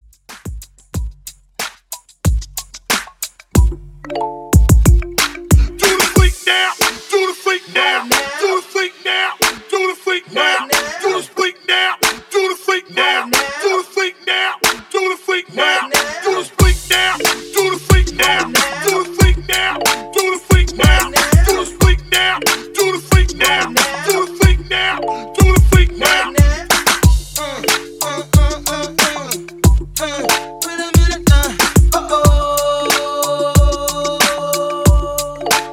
Break Intro Beat 92 BPM

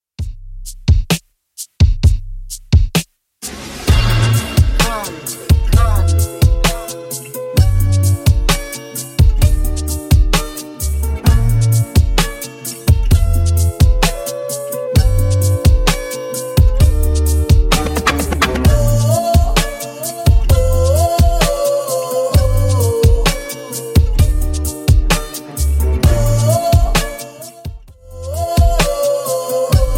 65bpm

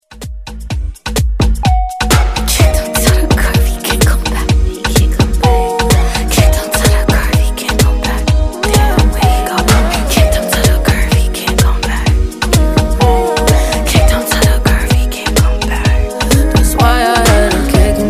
Dance; Redrum

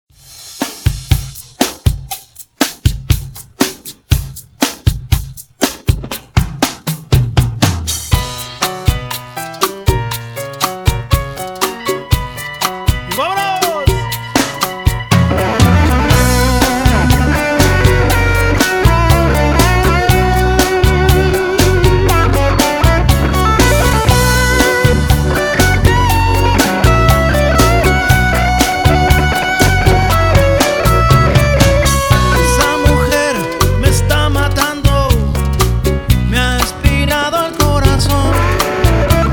Redrum 120 Bpm

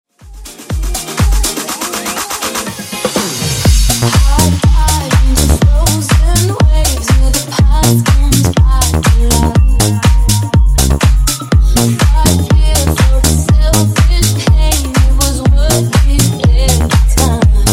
Disko Funk 122Bpm

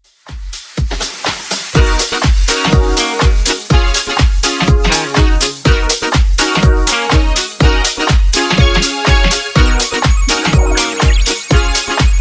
Funk 123 Bpm

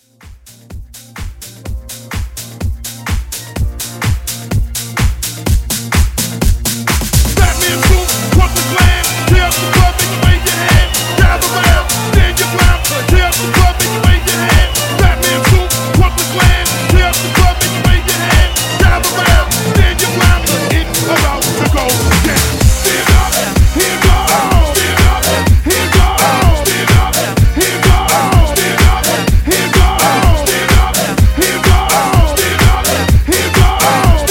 Mixshow Hype Edit 126

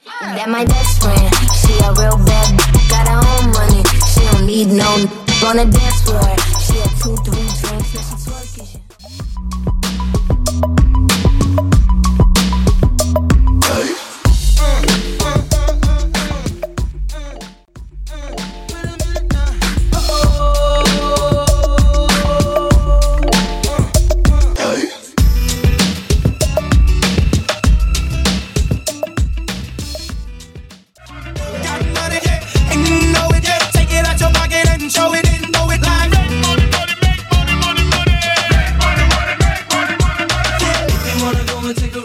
Hip Hop R B Megamix

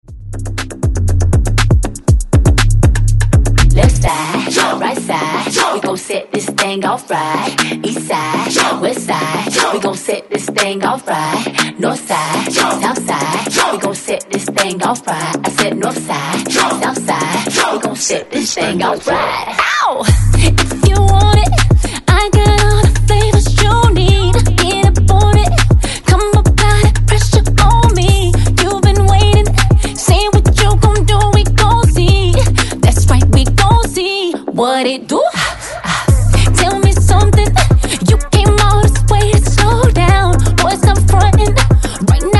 DJ intro outro 120 Bpm; DJDOLLS

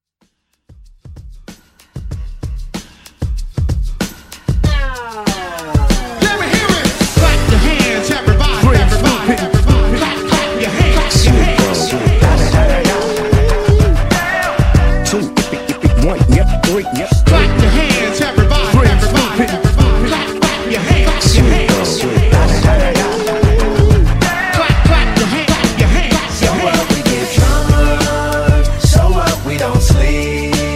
Moombah

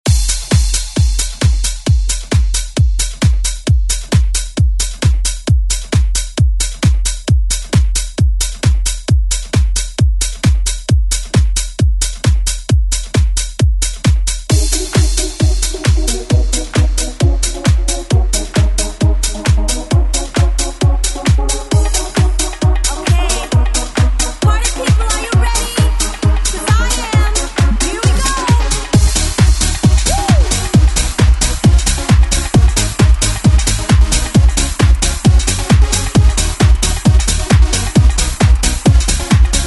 90s ReDrum

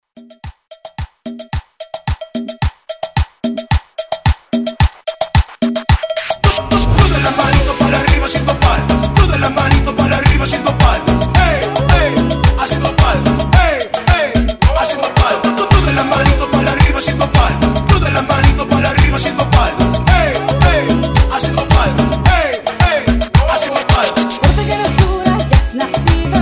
Latino Hype Redrum 110

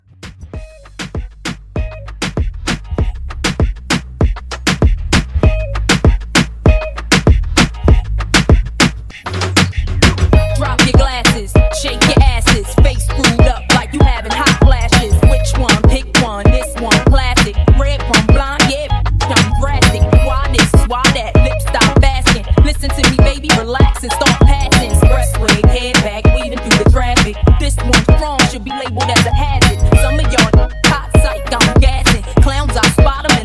Reggaeton Re Drum 98

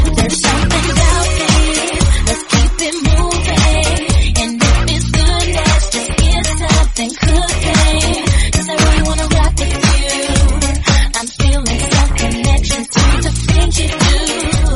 115 Bbm

